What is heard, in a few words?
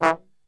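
E 2 trombone shot, Brass; Best Service; Brass Super Section; TRMB SHOT; 90 s Sample CDs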